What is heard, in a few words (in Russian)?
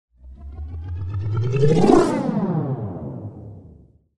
perehod s raskruchivaniem